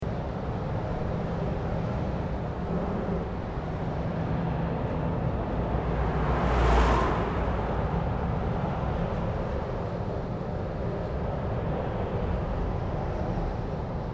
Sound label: Industrial Unknown Serge Unusual; Various industrial machines; Machinery